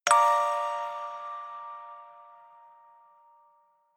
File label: music box hit